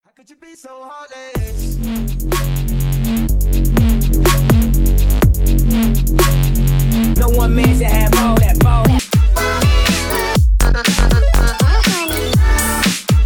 ringtone

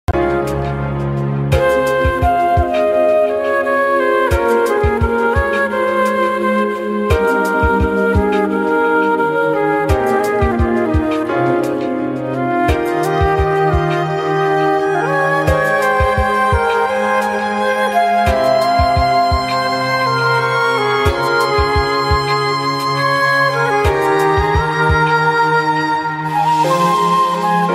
flute version

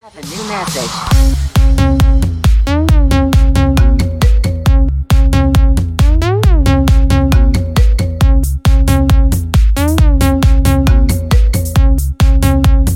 iphone xs

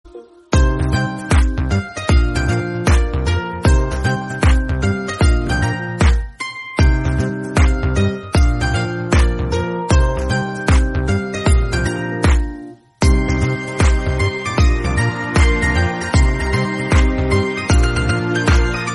Instrumental BGM Ringtone

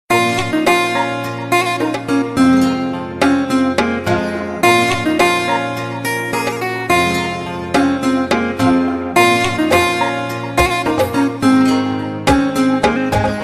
guitar ringtones

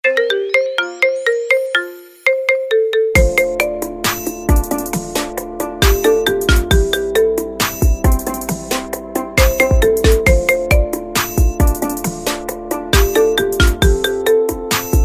marimba remix

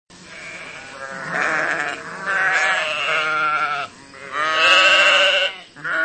sheep bleating; iphone ringtone

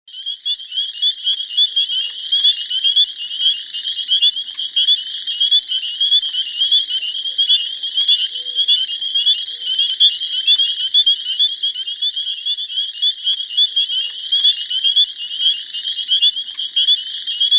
spring peepers

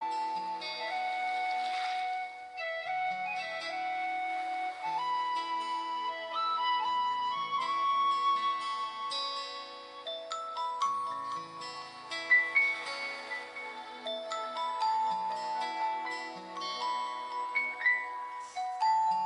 sound of the sea, vivo

